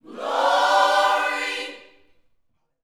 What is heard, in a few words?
Vocal Gospel PHRASE